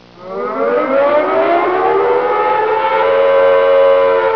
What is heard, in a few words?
air raid; desert